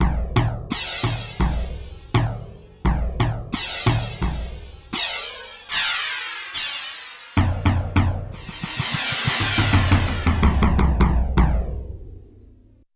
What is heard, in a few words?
Delay-Up, Cymbals & Bass Drum, Yamaha TX16W